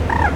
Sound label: Bird Coo; Birds